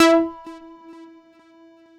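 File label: Single Note, Keyboards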